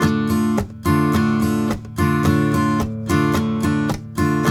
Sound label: RIFF, Blues Guitar; ILIO; Fretworks